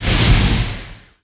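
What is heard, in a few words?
ice spell; kult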